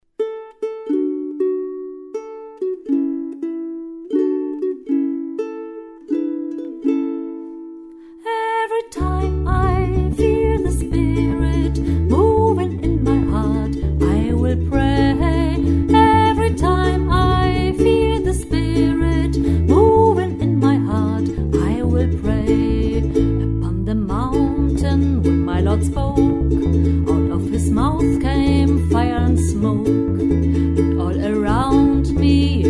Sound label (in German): every time I feel the spirit mit u-bass; Spirituals